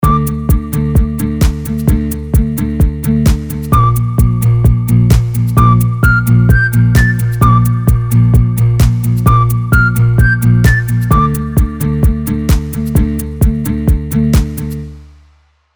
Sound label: Nexus 3 Whistle Theme; Artist Series KSHMR SQ